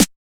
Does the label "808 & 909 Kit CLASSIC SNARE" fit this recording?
Yes